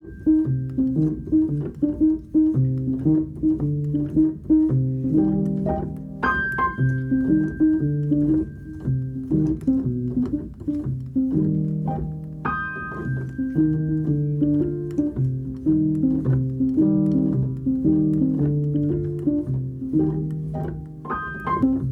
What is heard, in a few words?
Piano Solo